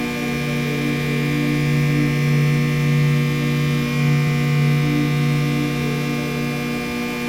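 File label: ambient halogen lights loop, electric; Half Life Model Pack; Half Life Alyx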